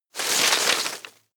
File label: rustle hard short; berufe; foerster